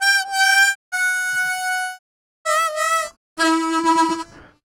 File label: Harm 098bpm A, Harmonica